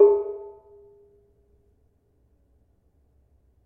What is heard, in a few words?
Bonang Barung, gamelan